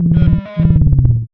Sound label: dog phrase; Garry's Mod